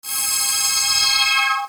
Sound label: string, loops synth